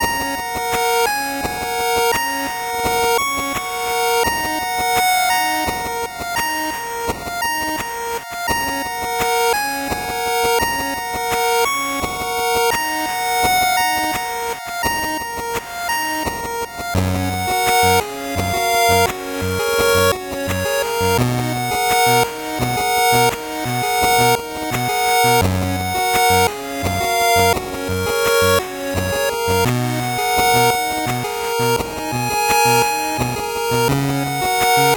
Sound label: 8bit bgm slow